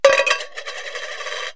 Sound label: Coin Drops into Tin Can; KABOOM! - Wild and Wacky Sound Software; City Life; Money